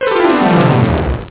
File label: PIANO; da capo vol1; synth chords